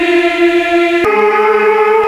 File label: mellotron; Rubber Chicken Software Sample Bank